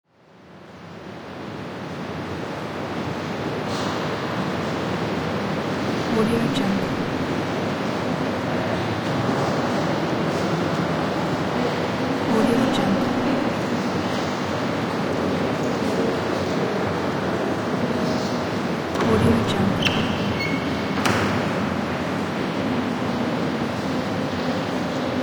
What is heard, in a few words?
church ambience